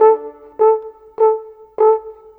Horn, Orchestral, Rock Pop; Sonic Foundry (Sony Creative Software); Orchestral Series VOL-4 - Rock and Pop

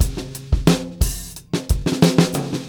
089, Beat Loops; Zero-G - Monster Beats

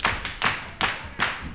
CLAP FEET; Spectrasonics; Vocal Planet; Blues Soul

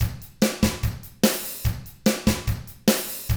rock drums

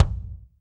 BD, Drums; Bob Clearmountain Drums I

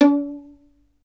STR VLN, Solo Instruments; Miroslav Vitous

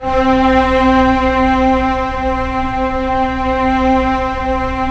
Symph; STR Vcs1 Symph; Roland L-CD702

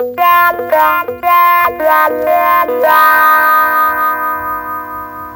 gtr, guitar

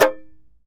TAR; Roland - LA Composer vol. 3

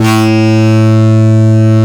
TURBO; NorthStar - The Wizard VOL-2; TURBOTRONIX

